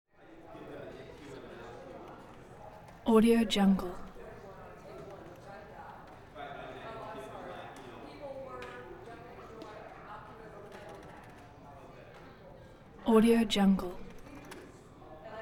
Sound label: tv newsroom busy reverberant walla